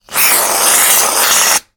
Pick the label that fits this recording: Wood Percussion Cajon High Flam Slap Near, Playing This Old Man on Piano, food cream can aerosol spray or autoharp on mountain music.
food cream can aerosol spray